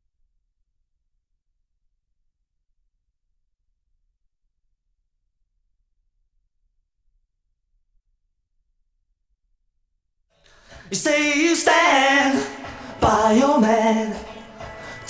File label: vocals